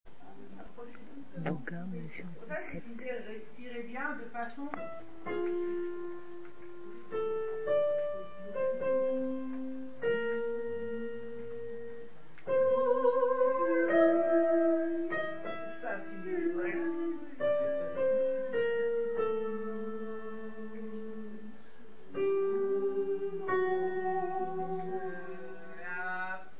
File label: piano